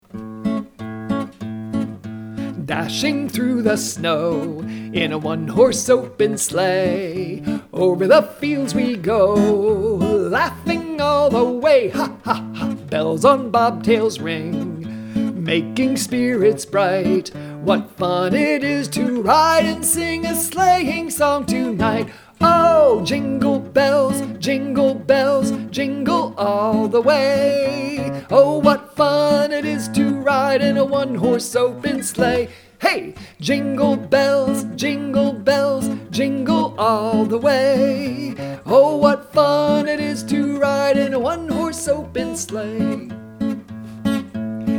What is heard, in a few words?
Jingle Bells